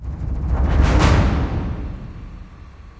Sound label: UI BGM Bid Success